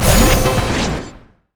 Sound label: orb return; LoL; ahri skin04